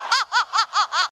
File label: Laughing